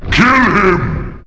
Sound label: pain; opentdm; beelzabub